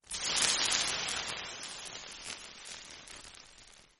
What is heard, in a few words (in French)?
cuisson